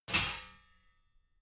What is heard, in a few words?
metal sheet crash light; metall